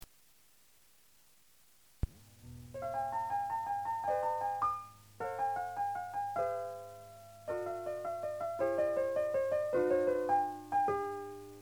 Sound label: triller